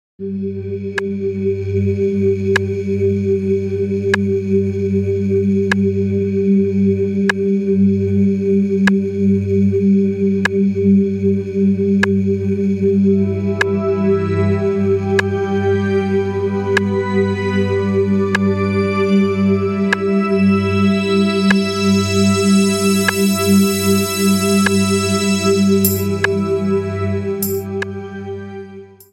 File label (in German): musik hoerprobe